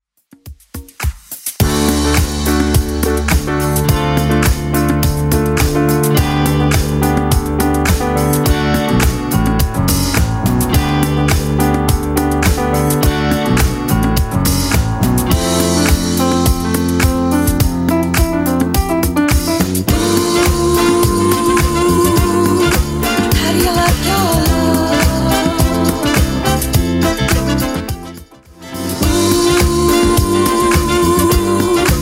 70s Club 105 bpm

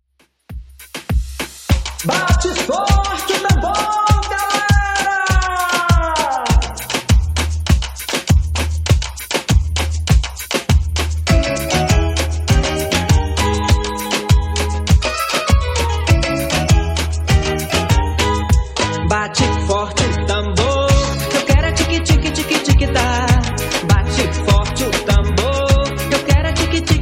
90s Redrum 100bpm

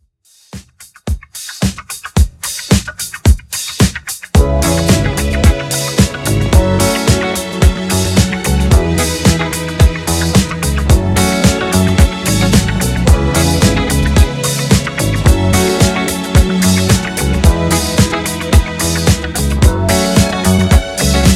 Redrum 110Bpm

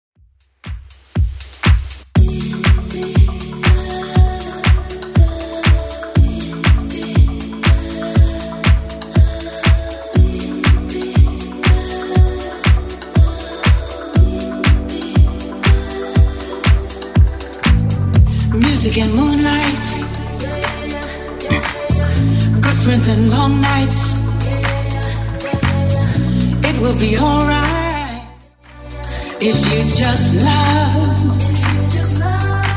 80s Club 120bpm

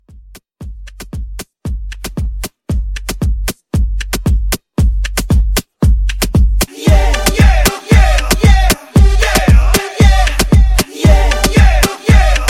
Moomba Hype 115 DIRTY

